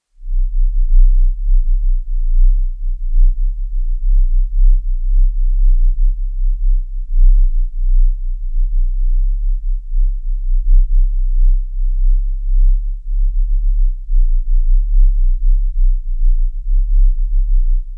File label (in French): bruit rose